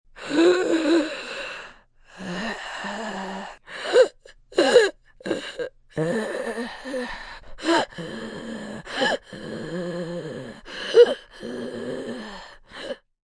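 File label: Breathing Rasp female, Breathing, female, wheezing, asthma, sick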